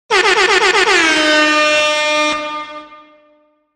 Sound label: airhorn; CPA Skill Check